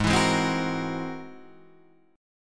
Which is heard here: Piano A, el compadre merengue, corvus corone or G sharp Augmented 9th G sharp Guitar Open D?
G sharp Augmented 9th G sharp Guitar Open D